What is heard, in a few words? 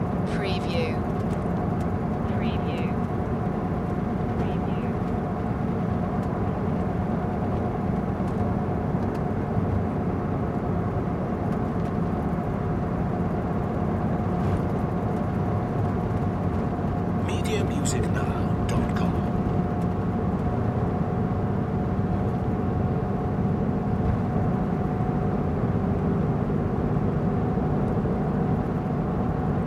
travel bus ride loop